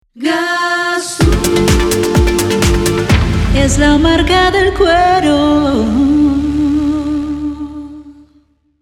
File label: jingle